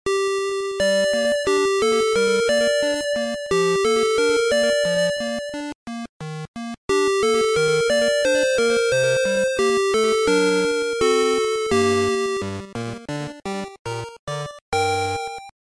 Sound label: Game Over theme; DKC GBC